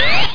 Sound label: Power